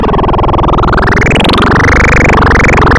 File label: pop, Sound of a mud pump